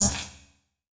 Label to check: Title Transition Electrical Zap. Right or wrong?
Right